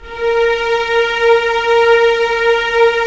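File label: string section; Roland - LA Composer vol. 1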